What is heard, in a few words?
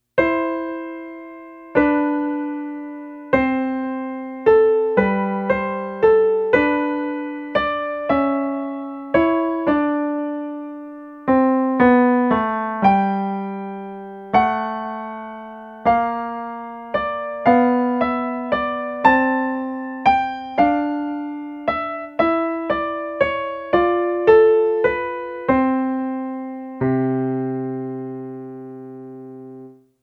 Waltz; Sleeping Beauty